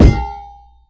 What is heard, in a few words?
hit hard, impact physics metal roller; hl2